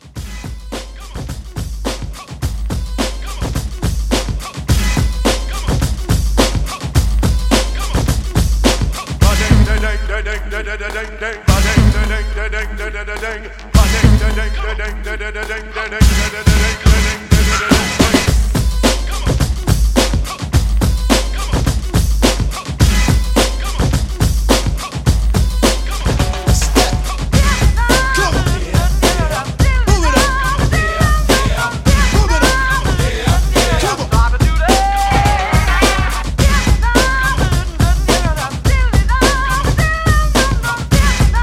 Old School 90s Re Drum 107

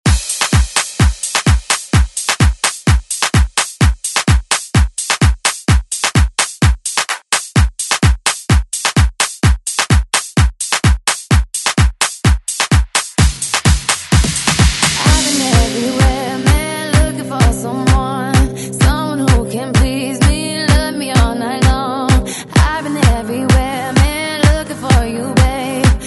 Re Drum; DJ Mhark

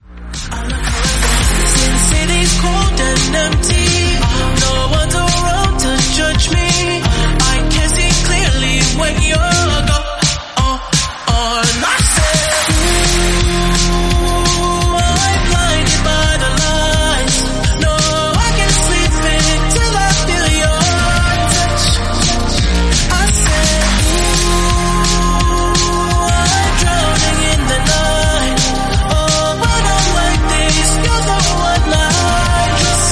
170bpm Fm

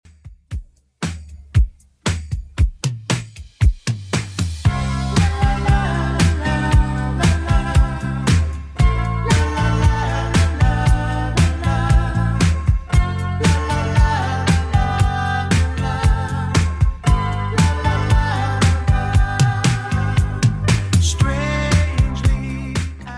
80s Redrum 116bpm

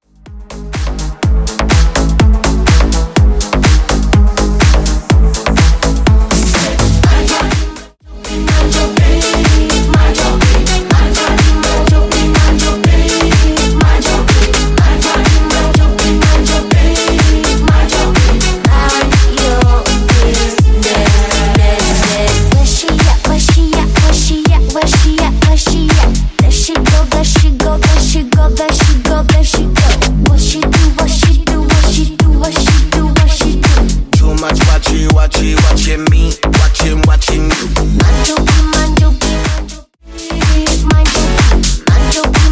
House Bootlegz 124BPM

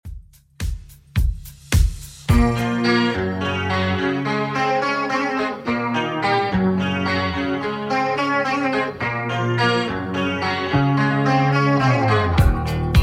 80s Redrum 107bpm

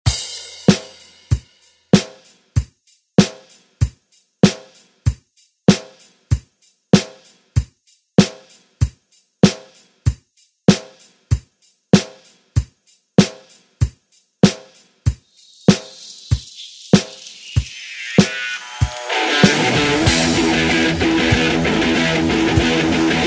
60s Re Drum

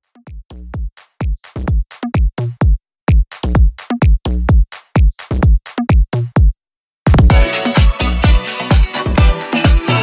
90s dance house 128 bpm

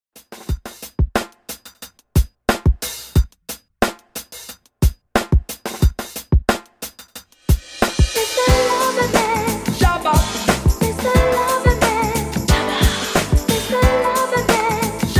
80s Reggae 90Bpm; remixplanet

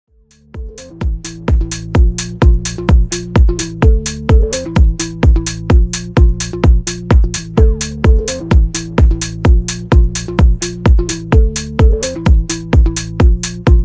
Re drum

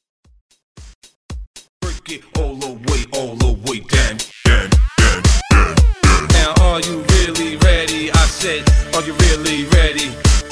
House Transition

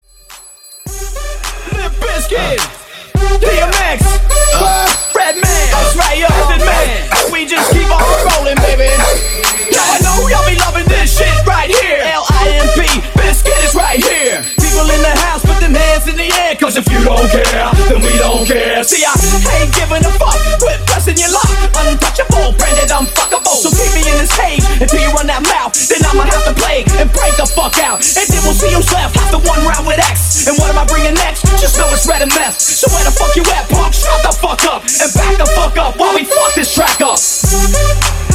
Mashup Breakz Mixshow 105 BPM

